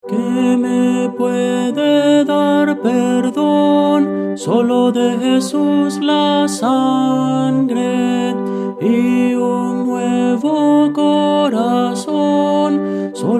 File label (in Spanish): tenor; Voces para coro